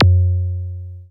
Percs, Elektron MachineDrum SPS1 MKII

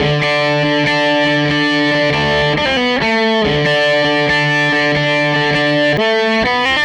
Strat Guitar Loop

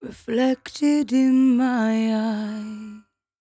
57bpm Am, Velvet Vocal; Zero-G

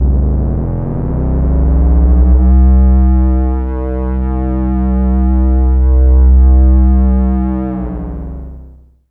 SUB WAVE, Special FX Twisted; USB Soundscan; AKAI

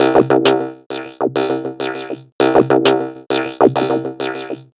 Organotron; Sonic Foundry (Sony Creative Software); Myths of Technology

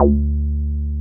303 RES D3P, RES BASS

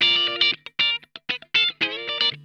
OCTALICK, Funk Guitar; Zero G - Funk Guitar

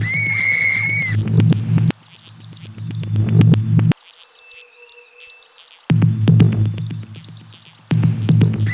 CREEPY; Spectrasonic Distorted Reality 1

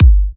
G, Kicks; markus hakala

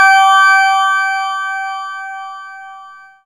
SYN, Analog; Sound & Vision; Gigapack I CD 2

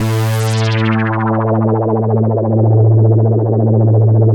JELLO, KORG DW8000, Vintage Keyboards; Big Fish Audio - First Call Vintage Keyboards

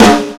Snare, drums; Sonic Foundry (Sony Creative Software); Drums & Drones; Construction Kits; Stream; Steam Kit

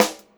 78 STR SD, Funk-Groove Drumloops; USB Soundscan vol.38; AKAI

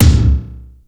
plastic tom; Crypton - DrumBase5000

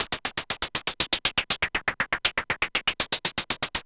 Gated Seq Noise, 120BPM

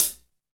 HH, KIT Real; 90 s Sample CDs; Sound & Vision - Gigapack I CD 1 (Roland); Real-Kit s 6

